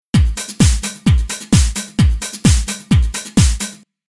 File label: Rhythm Loop